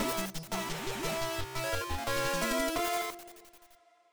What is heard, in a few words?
Desert Sting Reborn